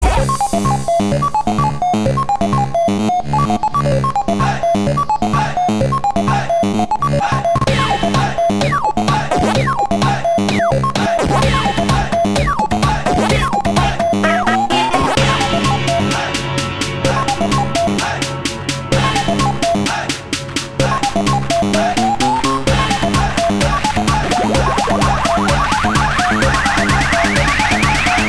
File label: pac man happy house remix